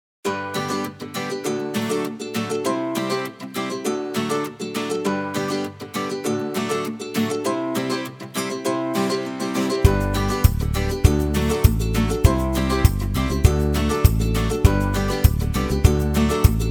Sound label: gaming music